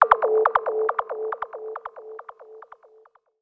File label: KEY SHOT TEKNO 140 A; Techno2; Singleshots